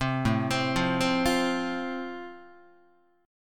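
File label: A Minor Sharp 5th, Guitar, chord strum; Am#5 arpeggiated; 3,5,3,3,4,4; JGuitar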